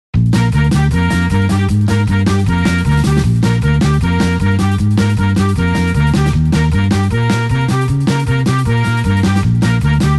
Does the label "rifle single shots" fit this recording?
No